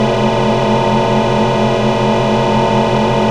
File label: forcefield; Quake 4 Alpha; id software